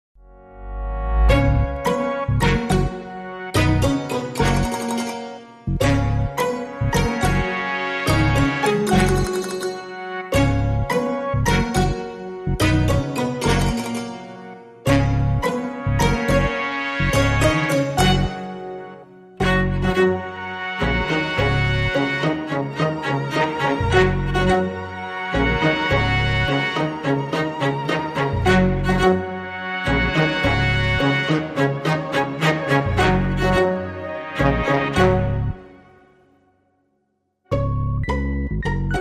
Funky Flock, Reduced

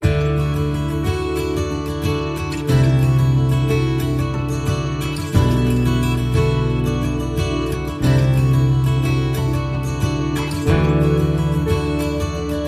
No Perc

